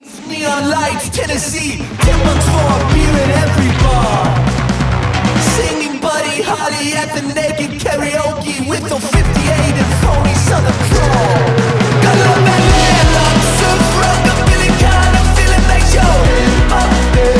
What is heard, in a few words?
surf rock